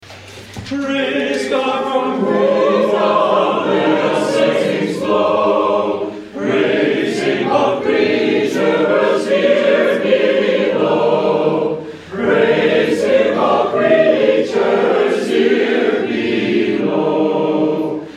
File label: hymn; Praise God from whom